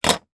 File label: toy plastic wrench put down on plastic workbench